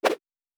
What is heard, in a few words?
Whoosh; Fantasy Sounds Bundle